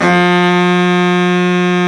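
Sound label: baritone sax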